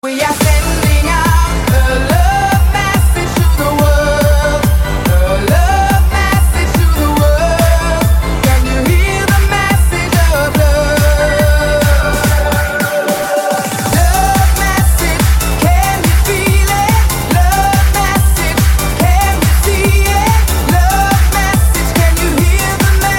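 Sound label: Disco Message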